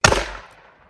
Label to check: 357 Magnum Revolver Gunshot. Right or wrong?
Right